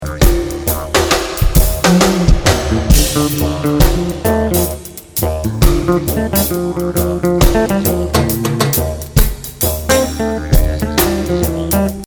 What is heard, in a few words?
Reggae Song Sample